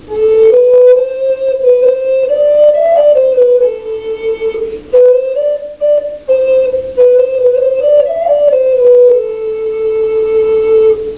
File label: recorder tenor